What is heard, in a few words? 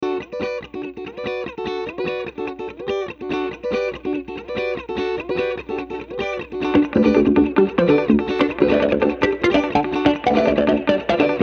instrumental, rock